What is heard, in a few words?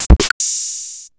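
Roland MV8800 drum DR-55; DR-55-S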